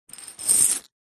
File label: leisure game plastic small discs pick up